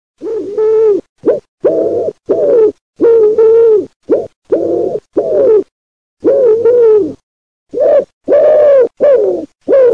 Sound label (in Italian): colombaccio